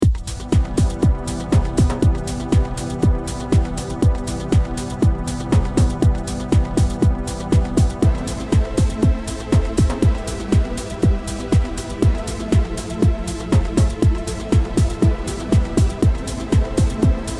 house progressive

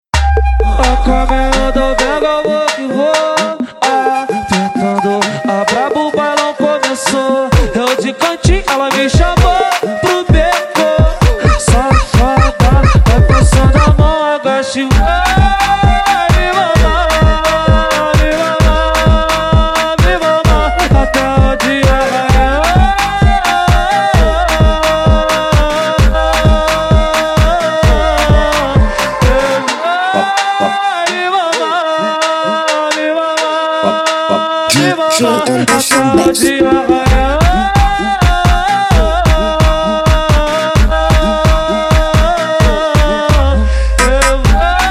bass rave funk

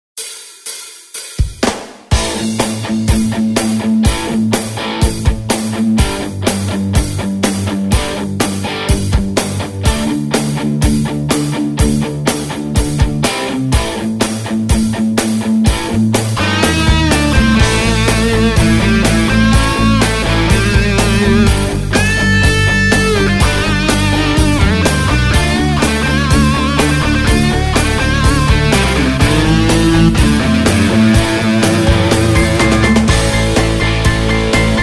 ritmo ketron; solton ketron

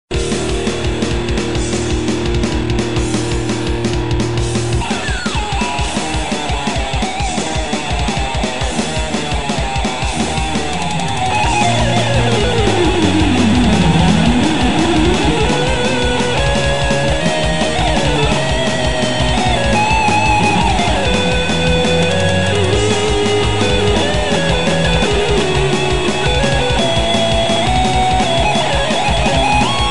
strings rusted

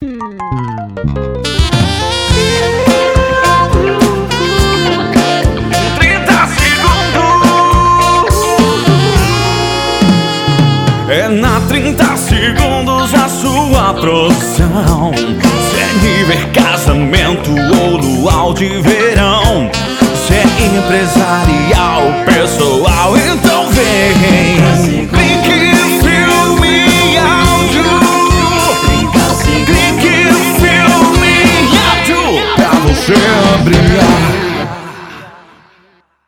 jingles

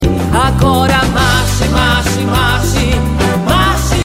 ringtone

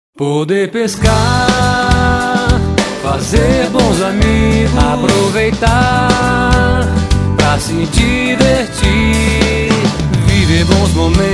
guitar jingle

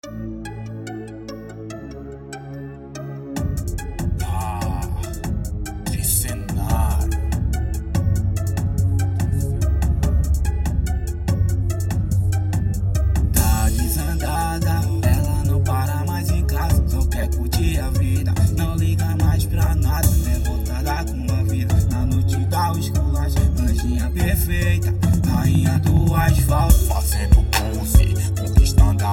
Hip-Hop; tricenario

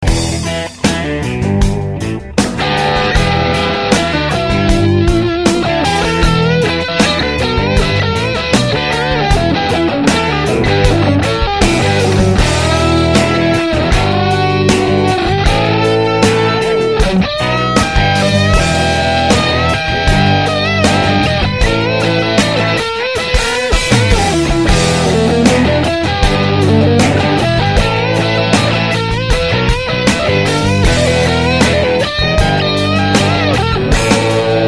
guitar solo na jam

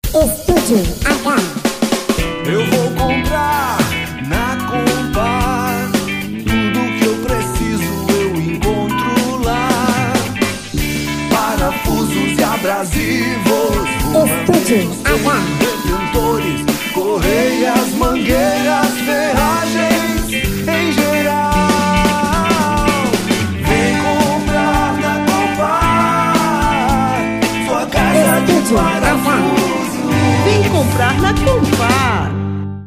jingle

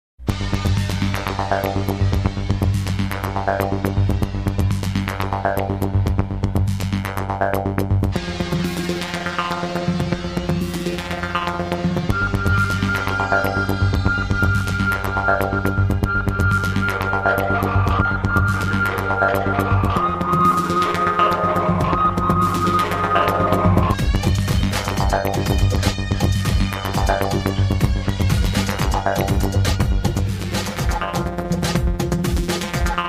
prelude

